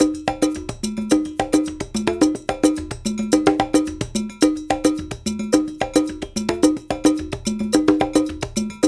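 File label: 108 bpm, Congo; Spectrasonics; Supreme Beats; World Dance; Isle Congo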